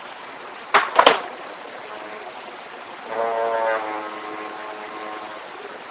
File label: weird sound